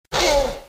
velociraptor call

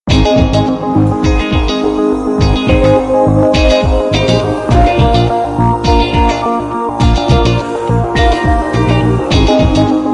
menu music; gta iv eflc

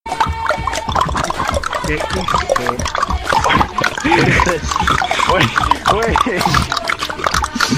clicking

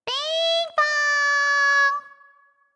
ping pong

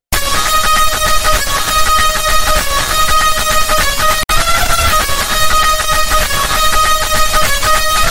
indian music bass boosted

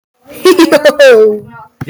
hehehahaho

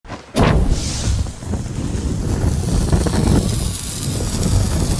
gunpowder barrel; sea of thieves

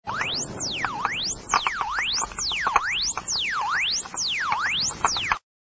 pokeball sound effects

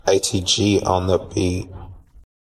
on the beat

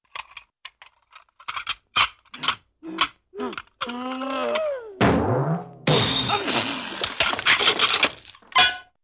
man falls sneezes and breaks the dishes

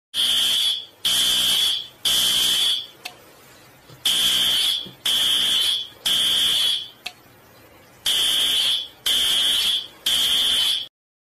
fire drill loud

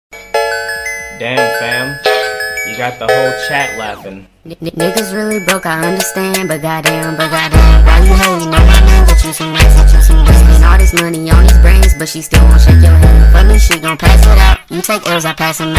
laughing meme

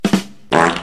badam pss fart

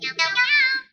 up meow

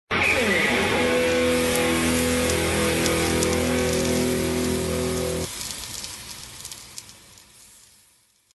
heat metal

